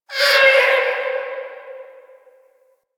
interior whiney critter; Ambience; Misc Indoor; DE; Warframe; Infested Eris

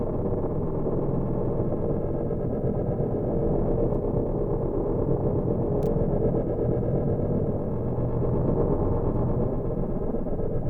Archwing Room Hum; Warframe; Hub; Tenno Carrier Ship; Liset

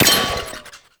Glyph Target Hit; Warframe; Duviri; Glyph Puzzle

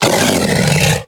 Feral Vocal Downed Struggle; Warframe; Kubrow

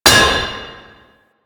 Worker Hammer Impact Metal, weapons

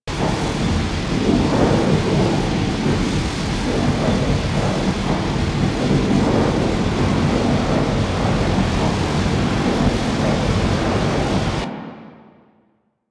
Ship Hyper Speed Loop; Warframe; DE; Vehicles; RailJack; RailJackShipHyperSpeed

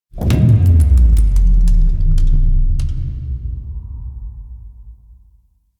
Time Slow Start; Warframe; Quests; The New War

